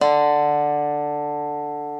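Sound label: reference pitch dobro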